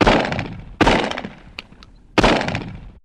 gun shots; Crash Bang Boom

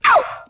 ow

